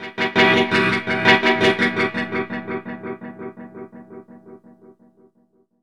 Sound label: Vapour Brass Synth; Jungle; Blu Mar Ten - Jungle Jungle - 1989 to 1999 Samplepack